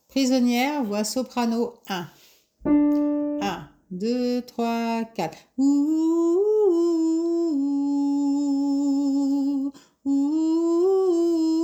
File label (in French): voix sop 2